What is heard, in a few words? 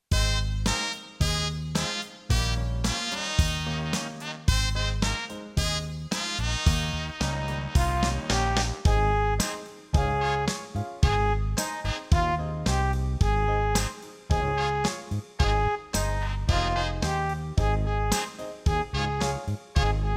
in Eb; brass; backing tracks